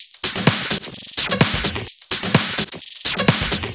Houseloops 128BPM; Vengeance Samples

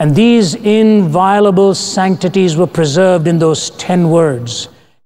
Vocal Phrases; Vengeance Samples; Vocals

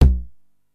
TOM L, 909; musicradar-synth-samples; Classic drum machines; 909TOMS

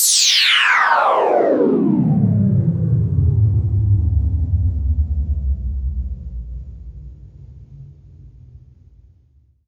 FX Sweep Down; vengeance essential club sounds-3

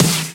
Snares; Vengeance Samples; VEH2

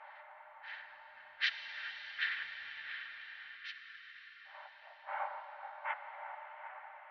135BPM Loop FX; Sound FX; F#minor

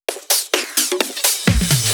Fill 128 BPM; Vengeance Samples; VEH1 Loops

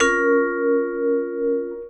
vb d#, vibes; house; PS-28 Vocal House Samples

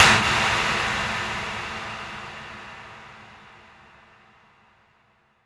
Impacts

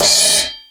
Crash, Cymbals; Vengeance Samples

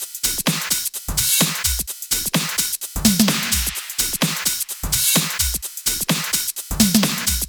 128BPM Kit; Vengeance Samples; Wasa Kit

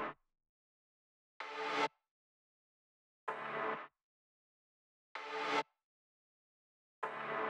128BPM Melody; Vengeance Samples; Emote Kit

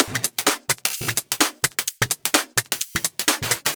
Electro Loop 128BPM; Vengeance Samples

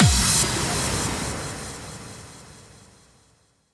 Impact; Vengeance essential club sounds-3

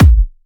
Kicks; Vengeance Samples

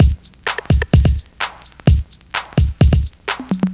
Housebeats, 128BPM; Loops; Vengeance Samples

